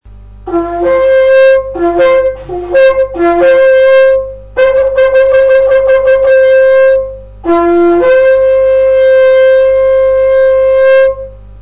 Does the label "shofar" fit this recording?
Yes